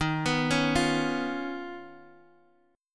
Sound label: Eb 7th Suspended 2nd Sharp 5th Eb, Guitar Standard; arpeggiated; chord